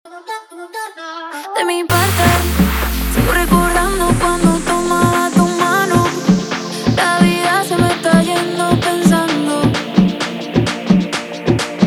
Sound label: intro outro 130 bpm